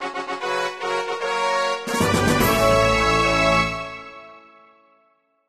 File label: Victory; MapleShrine